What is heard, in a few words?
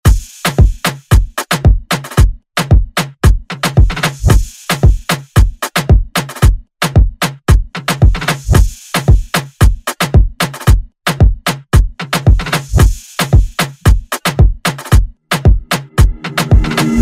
Moombahton Redrum